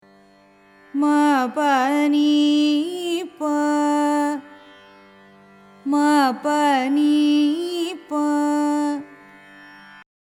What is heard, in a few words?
suddha dhanyasi, carnatic music